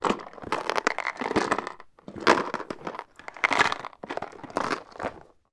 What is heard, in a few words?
toy game snakes and ladders in box tip movement; zapsplat